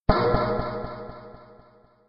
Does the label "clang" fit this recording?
Yes